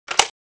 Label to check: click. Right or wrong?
Right